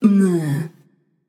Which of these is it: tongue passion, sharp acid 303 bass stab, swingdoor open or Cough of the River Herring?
tongue passion